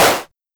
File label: Simmons Clap